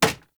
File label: Bow Impact Default; Weapon Impacts; Player; Bows; Hunter Bow; Environment; Envoy Hunter Bow